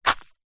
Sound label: footstep single sandal woods ground stoney path